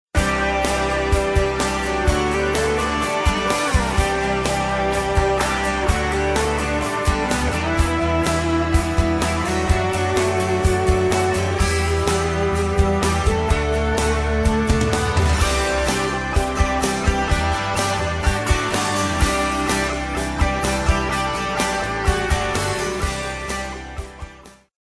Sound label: pop rock